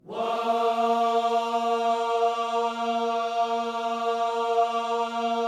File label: "Whoa", Gospel vocal, A#3; Spectrasonics; Vocal Planet Vol.1 Gospel; akai